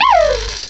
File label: Cry